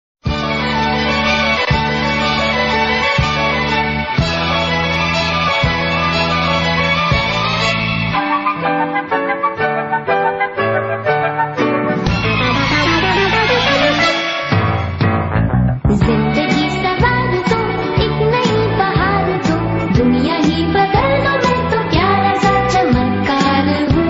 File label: bgm ringtone bass boosted ringtone